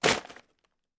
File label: trash